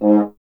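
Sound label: French horn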